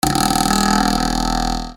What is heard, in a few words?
SQUEAKS